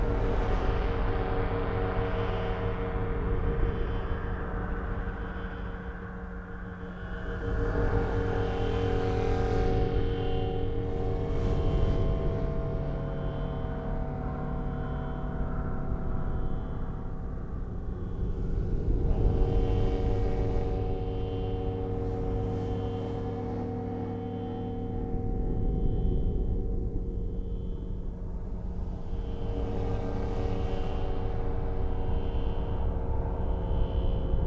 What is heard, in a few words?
drone